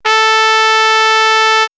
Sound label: Trumpet, horn section; X-Static Goldmine; backtothe70's